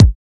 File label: Kick